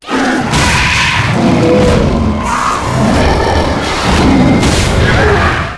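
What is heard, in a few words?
boss death; boss3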